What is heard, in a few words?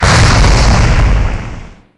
fireball